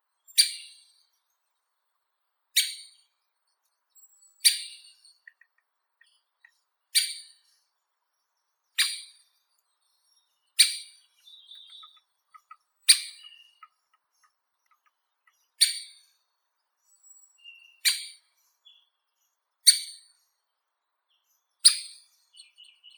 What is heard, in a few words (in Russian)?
dyatel bolshoi pestrii dendrocopos major